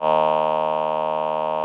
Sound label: VP-330, String Machines